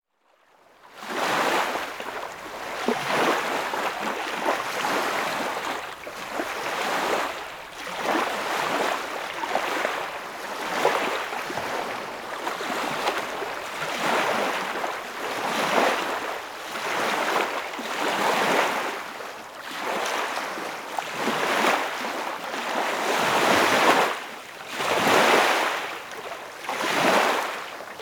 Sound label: ambience lake; NCH Library